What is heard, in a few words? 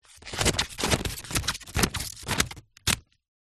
office scissors cutting paper